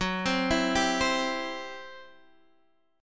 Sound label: C Major, F sharp, chord